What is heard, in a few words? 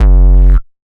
Bass, Dubstep 808 Basses; GhostHack